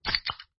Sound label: soda open; Picture Keyboard